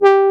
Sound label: JX BRASS, Jupiter Synths